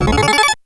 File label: Coin; Saint Dragon